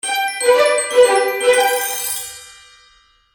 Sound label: Magical Music Finale, Cartoon Music Sound Effects